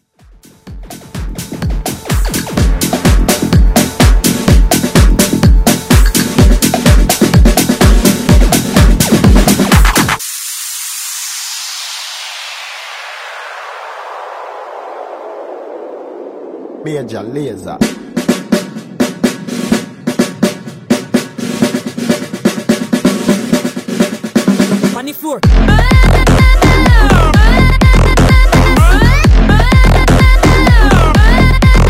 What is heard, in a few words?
Bass Edit